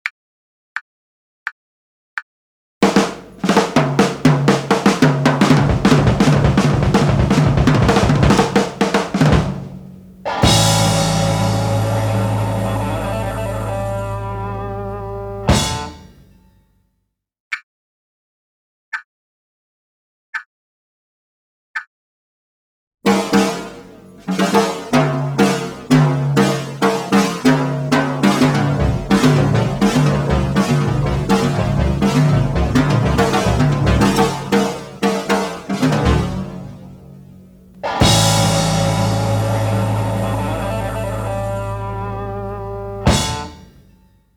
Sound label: rock and roll end solo, drums